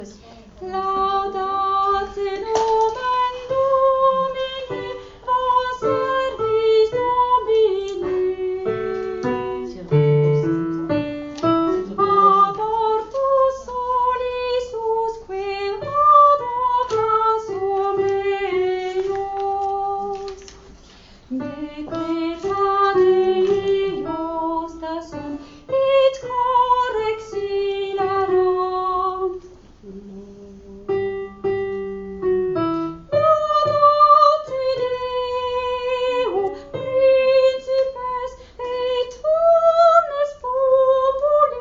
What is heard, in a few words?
laudate sopranos